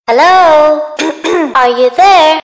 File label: short msg tone; ringtones; sms